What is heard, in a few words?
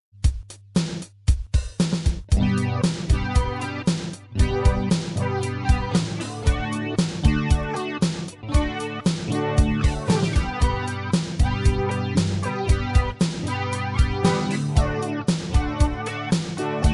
digitech pro